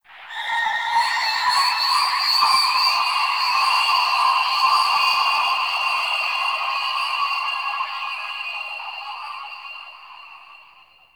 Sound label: Possessed Texture, Ambience; Warframe; Shadowgrapher